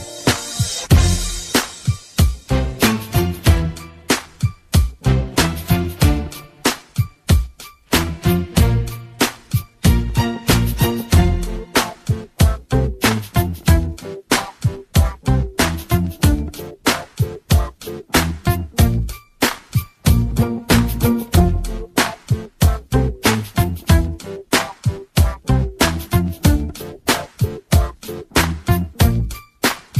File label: Instrumental